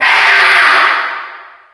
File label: breathing, stalker; hl2